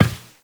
Step Glass, Footsteps Walk; Warframe; Characters; Tenno

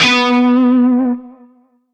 Shawzin Flourish Oct Two B; Music; Warframe; Narmer Shawzin

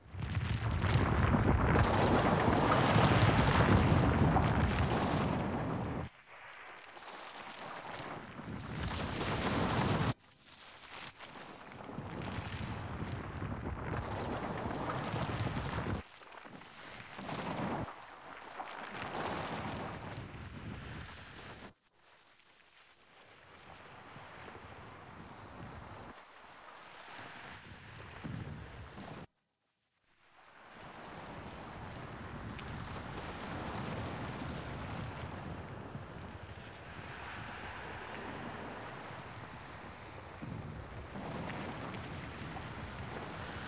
War Room Ambience; Warframe; Cinematics; The New War; Lua War Room

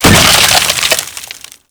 Ice Ball Impact; Warframe; Frost

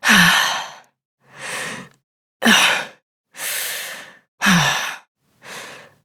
Breath Heavy Slow, Adult; New War; Adult Operator

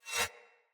Saw Dagger Whoosh Accent; Weapons; Player; Swords

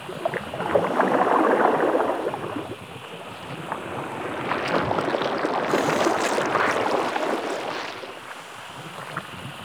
Toxic Coolant Loop A; Ambience; Props; Loops; Warframe; Venus Open World; Venus Landscape

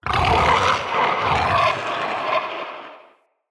Corrupted Slug Sub Boss Vocal Attack; Creatures

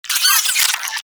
space drone pain; Warframe; Corpus Space Drone

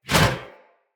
Sphere Board Whoosh; Weapons; Swords; Sphere Sword And Board

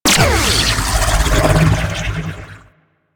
Nullifier Cast; Corpus Nullifier; Corpus Ship Remaster

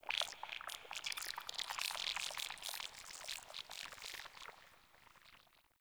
Tunnel Critter; Warframe; Tau

